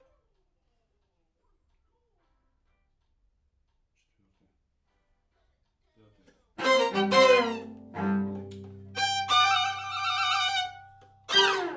Main Strings

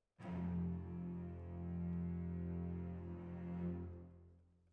Violins, jazz for piano

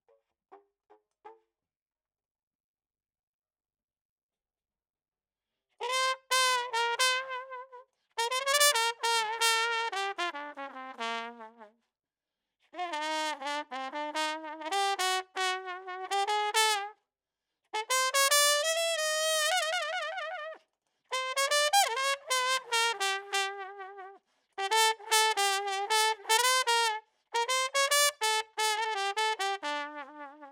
Trump

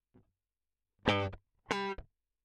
Guit Solo Line, RNR for piano

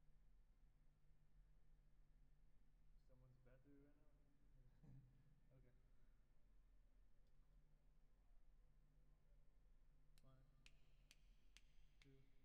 Drums Verb

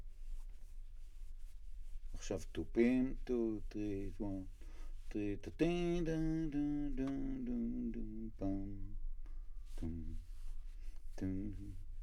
Bass Bot

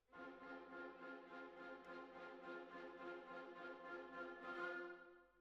Cbasses

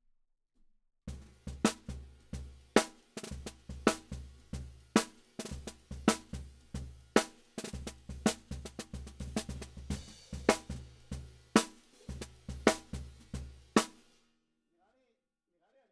Snare Bot

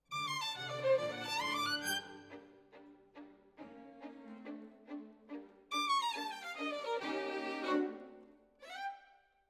Violin Solo

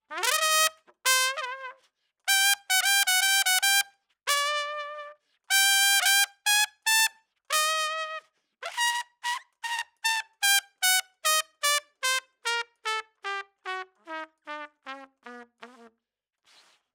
Trump; RE20

